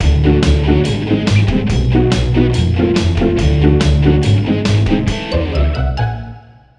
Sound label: Bari Sax